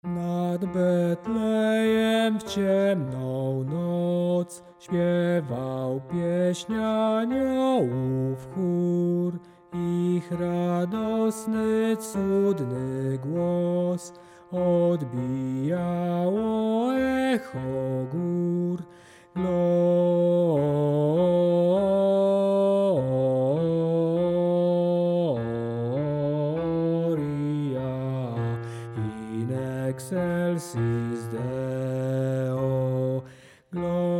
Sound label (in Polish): bas; Nad Betlejem w ciemna noc